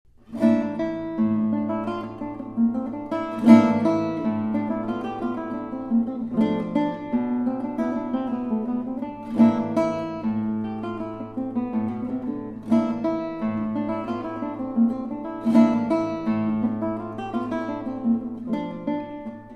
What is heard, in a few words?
Solo Guitar; Three Short Pieces